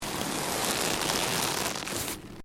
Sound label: LEATHER MOVEMENT